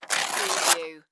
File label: Magazine Page Tear; sound-effects-bible